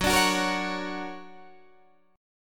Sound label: D Augmented Major 7th Gb, guitar